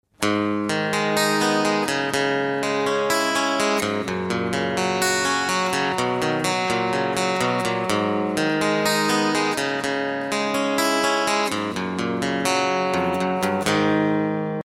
GUITAR RINGTONE

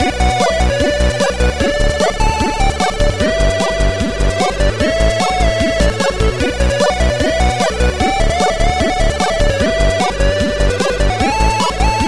KEYGEN MUSIC RINGTONE; HELIO BAR XP

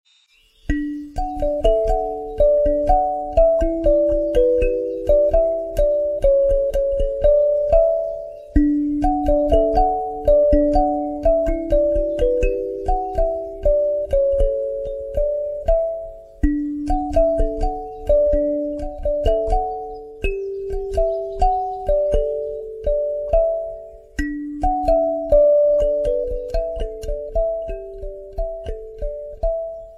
INSTRUMENTAL RINGTONE

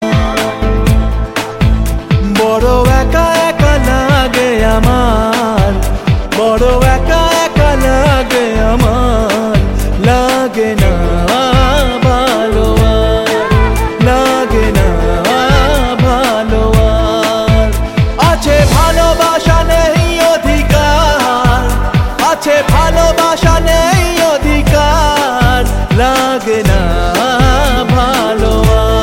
BENGALI SONG RINGTONE

